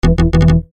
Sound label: incorrect Answer